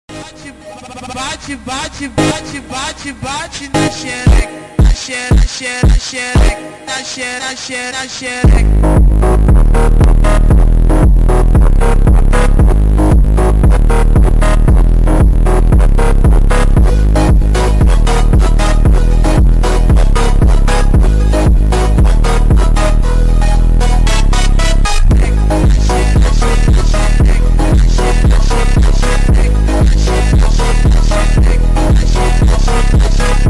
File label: Brazilian Phonk